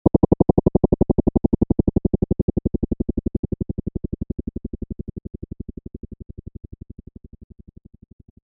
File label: Helicopter Chopper Blades